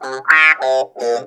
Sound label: NOTE BOX, Funk Guitar; 90 s Sample CDs; Zero G